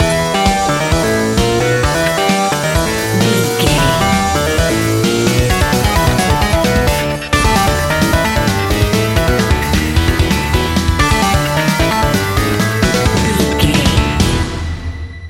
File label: extreme racing video game level bumper